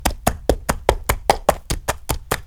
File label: donkey trot; Birthday Party Bash; Wii